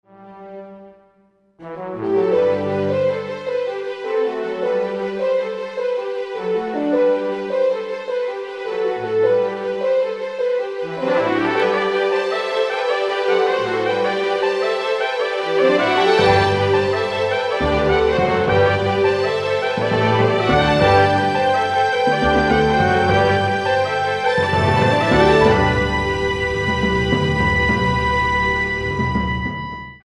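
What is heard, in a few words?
Didgeridoo Concerto; 4th movt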